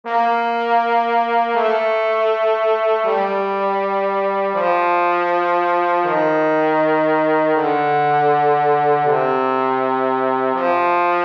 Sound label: trombone